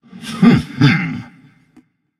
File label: heh heh; smite; arthur